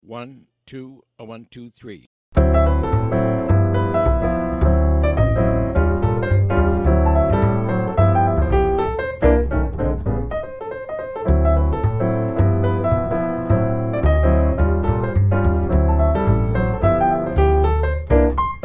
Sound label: minus drums